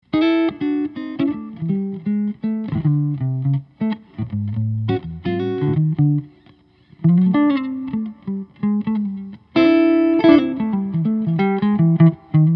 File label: guitars; Tom Bills Natura Deluxe 17 archtop; maple; spruce; electric